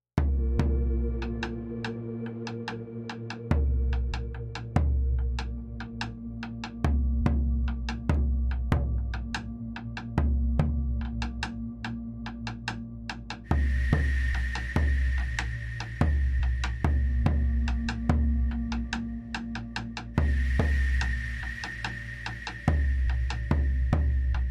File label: Fx PAd; XantaFe